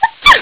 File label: sneeze woman; phantom lady